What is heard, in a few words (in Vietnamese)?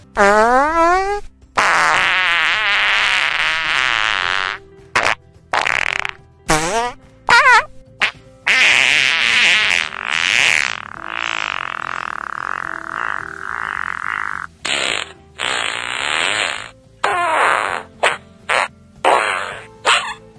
Tieng danh ram